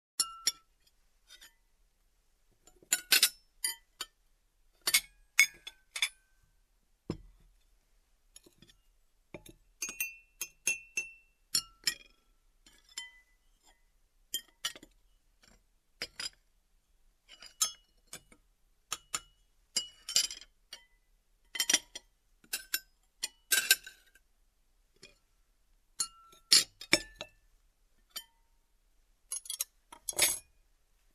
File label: Dishes clinking on dining table